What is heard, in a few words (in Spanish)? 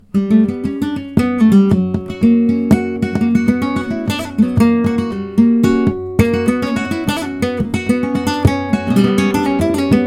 falseta